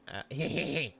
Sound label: heheheeee